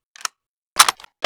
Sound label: reload, weapons; hl2